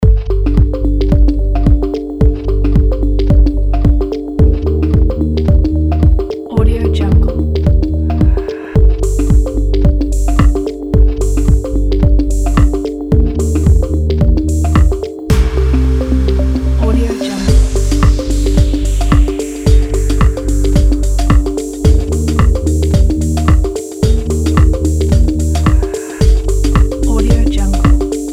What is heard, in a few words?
electronic drive; alexgmusic